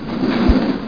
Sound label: cleaner; abuse